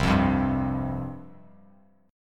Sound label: C Suspended 4th C, Bass Standard; Csus4 strummed; 8,8,10,0; JGuitar